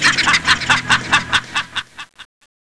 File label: laugh devil